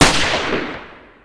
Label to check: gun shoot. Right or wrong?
Right